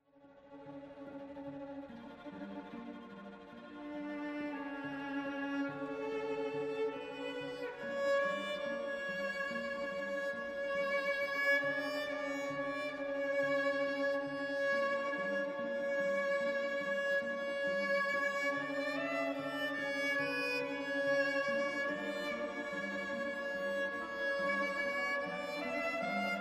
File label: string quartet; bacewicz; no. 4